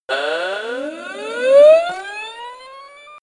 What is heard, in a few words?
verbal noises